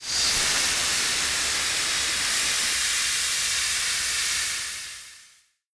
steam pipe burst; Explosions; Call of Duty Modern Warfare

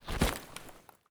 gear fast stereo; Escape from Tarkov

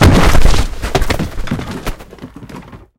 sandbag crash

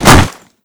bomb plant no tick; Call of Duty Modern Warfare

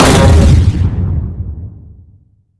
ui arcade extra life; user interface

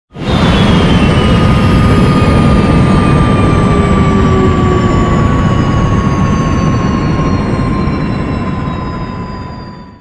engine tvd stop cockpit; War Thunder

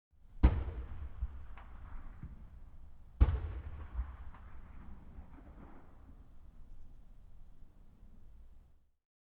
ambient shot stereo

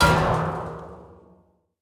loot barrel impact; Rust

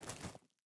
footstep burlap forest walk; Rust

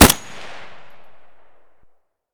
m1a fire distant; Escape from Tarkov

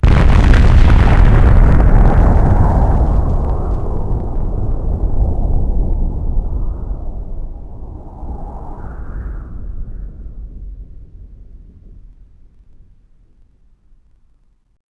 ICBM Bomb Expolde; Civ4 warlords

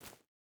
footstep barefoot forest jump start; Rust

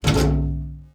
glass jump

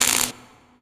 elec spark welding; Modern Warfare

